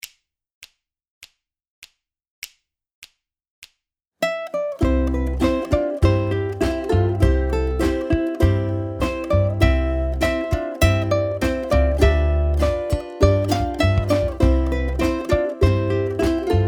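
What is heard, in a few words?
TURKEY IN THE STRAW BACKING, ukulele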